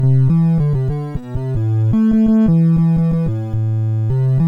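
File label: Pirati, MOS 6581 SID chip music, games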